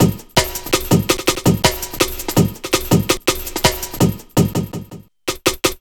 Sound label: box set, Drum Bass Drumloops 165bpm; Zero-G; Total Drum Bass